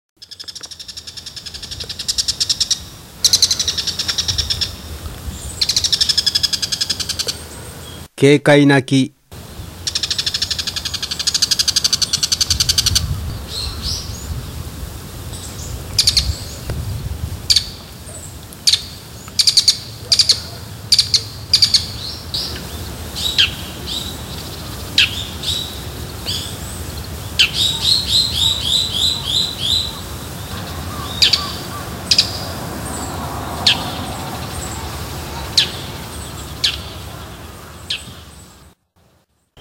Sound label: mozu, bird; azamidani